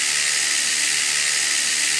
whir WHRR

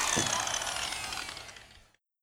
whir down short

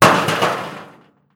stone CRUMBL

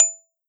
marimba E5 DING

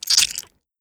blood, SKWLCH

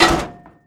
clang CLANG

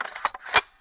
insert CUHRUP

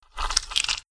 gut CRSKRL

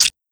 blood Short SKWLCH

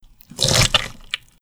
gut SQWCH

